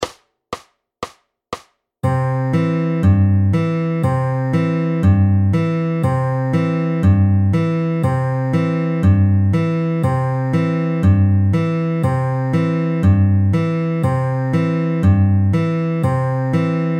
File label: Travis Picking FAST